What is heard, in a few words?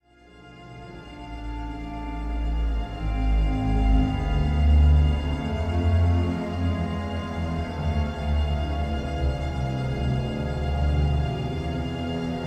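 music heroes symphony alt ambient; heroes of the storm full gamerip 2015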